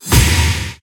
free Spin Outro Bang Oct Up; hotline2

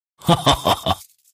panda Large Win; shangrila

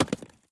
symbol Stop On Stone; eldorado

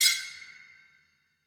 gem Picked; fairymirror

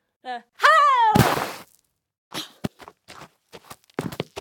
big Win Jack; jack and beanstalk

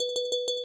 big Win Count Up Loop; elements

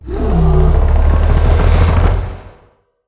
near win; serengeti kings

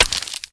fs Hit Light Ice Add; wild worlds

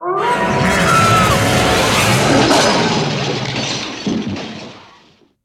wolf Appear; fairyred

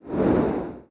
Zoom out to show more of background; koi princess

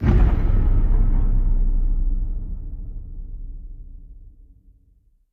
continue Button; mercy of the gods

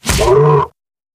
grunt hit light; wild worlds

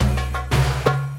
spingle Drum Loop; wild bazaar

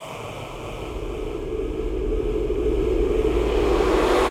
combo Anticipation; pyramid

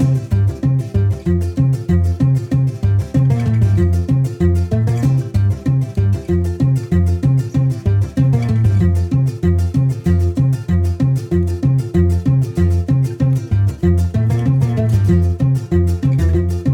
ambience Music Layer Tri Up; willyshotchillies

